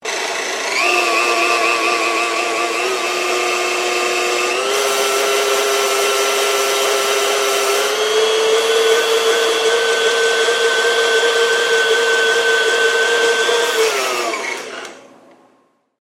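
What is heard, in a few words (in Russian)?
звуки блендера и соковыжималки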